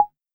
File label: Rim, Roland TR-55; Drums